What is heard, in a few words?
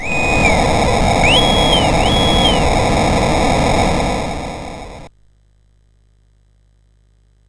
sid, MOS6581R4; Classic Concentration